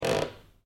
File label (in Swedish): Knarrljud; granny chapter two; android